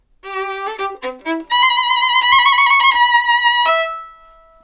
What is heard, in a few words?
violin samples Surprise